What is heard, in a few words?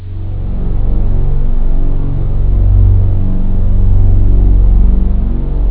Ending bass strings; jazz jackrabbit 2